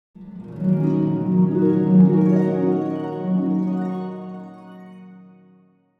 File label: Cartoon dream